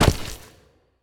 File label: movement step; smite; god odin